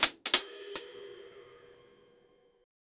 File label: half closed closing hihat, cymbal